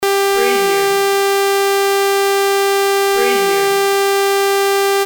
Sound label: beep